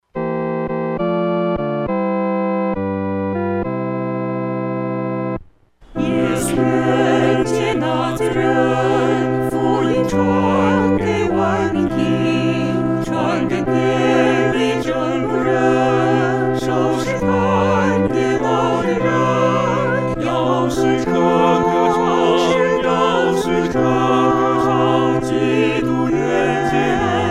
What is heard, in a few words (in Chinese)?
合唱 四声部